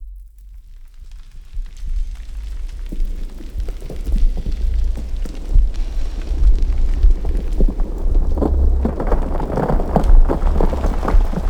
riser firing debris

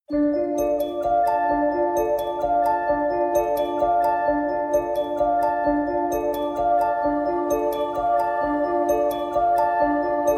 handbells D; brand x music

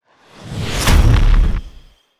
impacted hit big

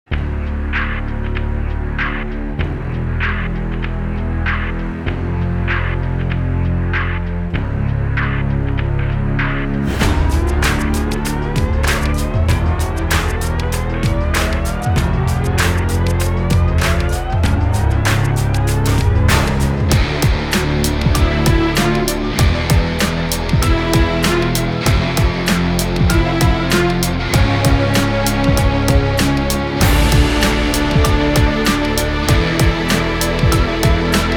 no choir

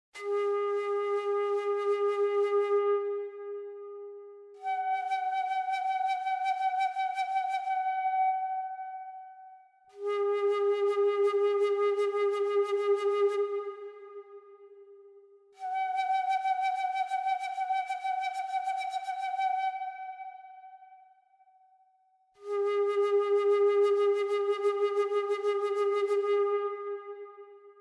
native american flute, whale song

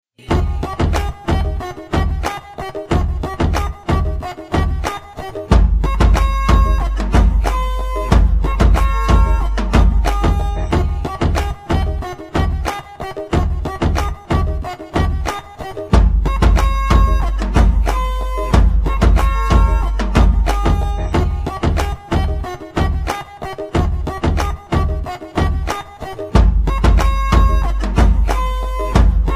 tambourine instrumental

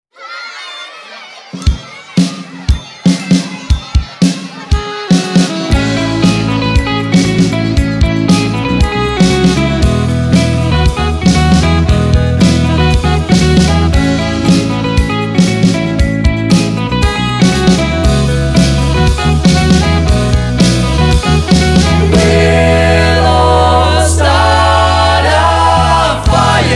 glockenspiel song

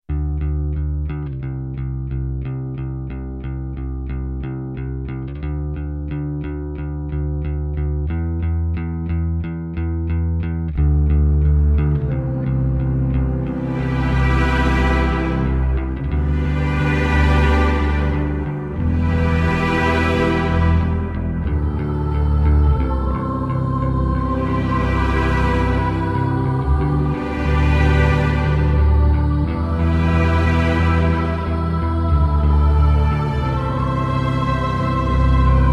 bass and choir

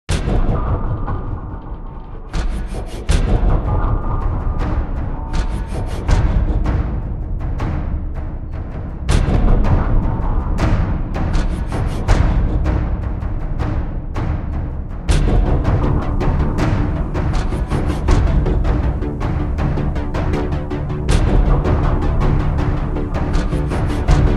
drums fx

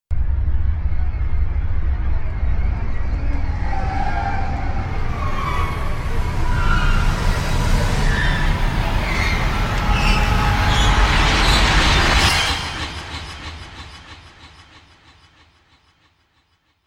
string ramp

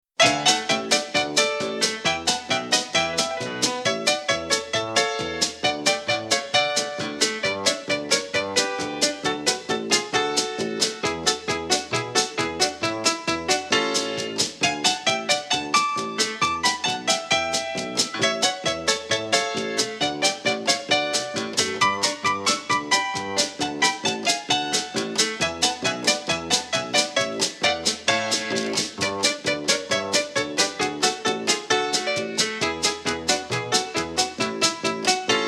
clean silent orch honky tonk

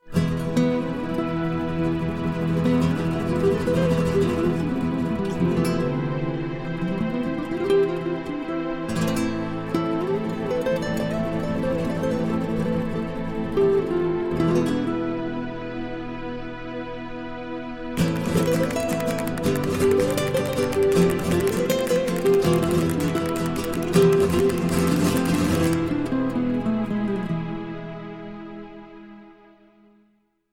flamenco dance

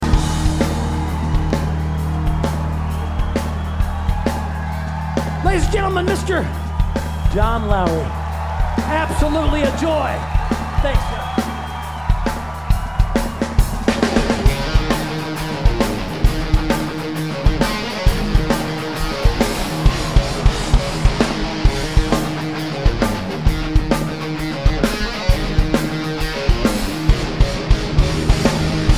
guitar solo

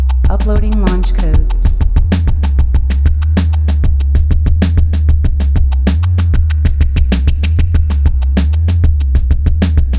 73 hz sine wave

